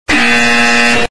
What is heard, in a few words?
EXTREMELY LOUD INCORRECT BUZZER